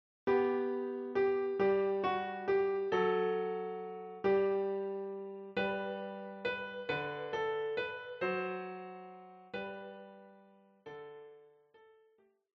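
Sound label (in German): Klavier; Bunt sind schon die Wälder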